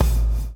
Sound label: BLOW; Spectrasonics - Backbeat